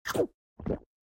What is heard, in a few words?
Eat Scrap; Lethal Company